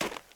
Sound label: snow walk, step; blocks; Minecraft; Remastered